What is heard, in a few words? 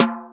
R8-TOM; X-Static Goldmine 1